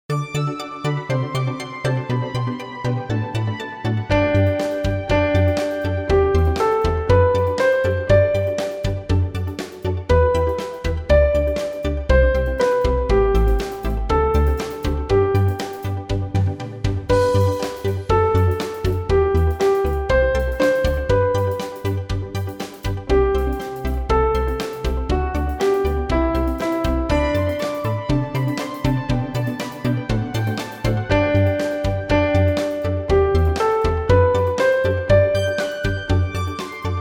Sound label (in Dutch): Ps 136 instr